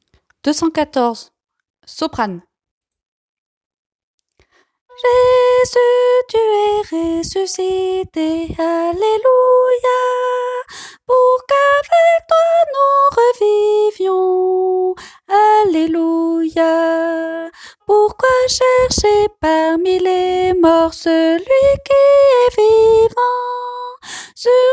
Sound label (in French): soprano